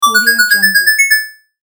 retro next level; magicsoundeffects

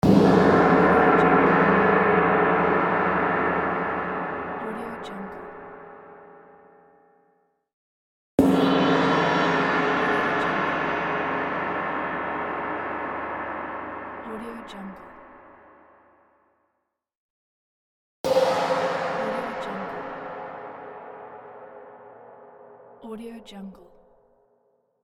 chinese gong

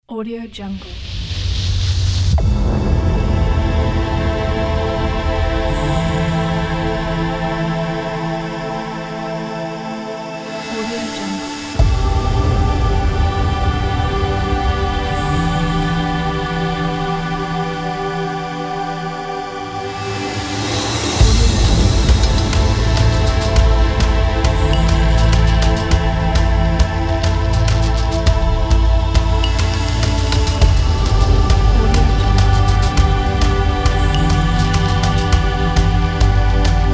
dark voices epic movie trailer music

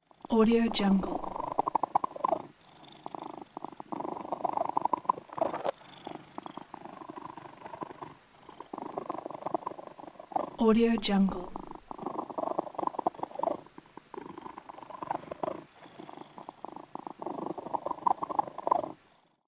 cat purrs

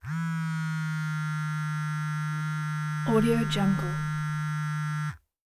cell phone vibrate

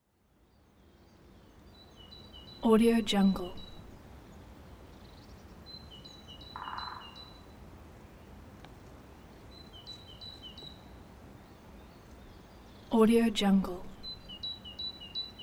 woodpeckers in morning forest

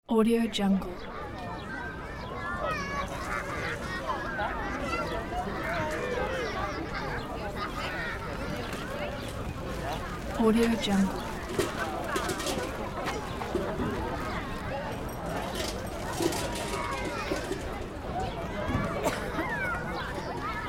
playground ambience

